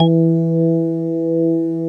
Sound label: METAL B